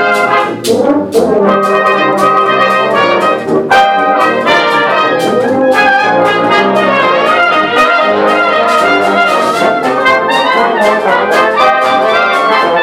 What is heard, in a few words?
jazz Brass; Amsterdam